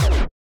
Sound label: charge short; CrossCode; battle